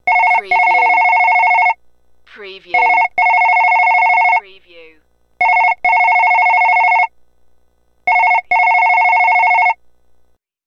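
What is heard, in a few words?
Telephone 4 Rings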